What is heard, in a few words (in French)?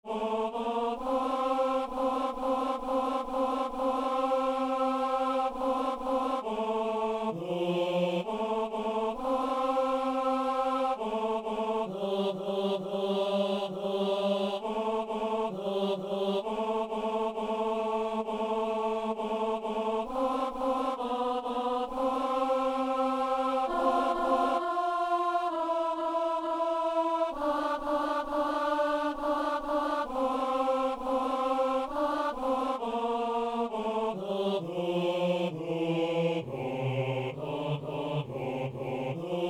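ténor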